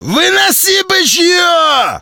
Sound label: attack; metrocraft; customnpcs